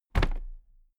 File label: toolbox place upright regular